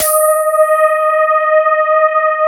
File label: CMI PIANO; East West; Twisted Textures-BT